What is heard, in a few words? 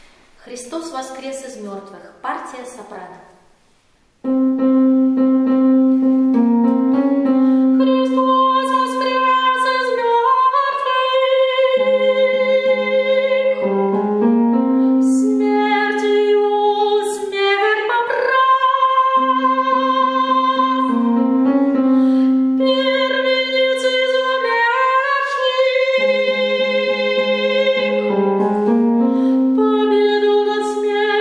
soprano; epiphany; Xristos Voskres Iz Mertvix